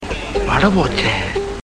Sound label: Ringtone; Vadivel-vada Pochey; Tamil Ringtones; 2009 Tamil Hit Ringtones